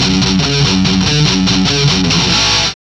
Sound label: GTR; Roland - LA Composer vol. 2